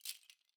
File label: rattles Single Hit Clams; Slate; SD SEISMIC Drum Sample Pack